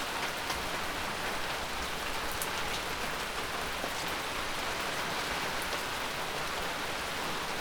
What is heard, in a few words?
rain